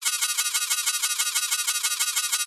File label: science fiction alarm computer fast short emergency